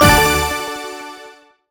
win small drop; dazzle

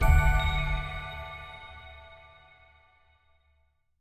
quick Stop Free Spin; fairyred

